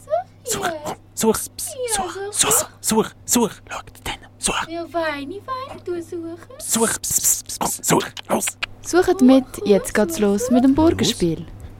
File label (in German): Jingle; Burgenspiel; pfadi radio